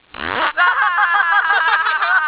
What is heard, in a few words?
fart